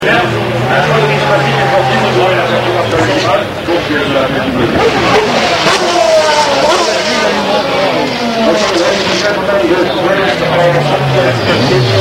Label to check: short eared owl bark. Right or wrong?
Wrong